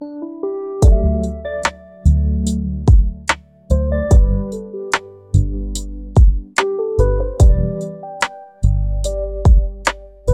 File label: rainy lofi